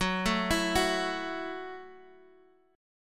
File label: Gb Minor 7th Gb Guitar Open G; x,x,4,2,5,4; down; chord shape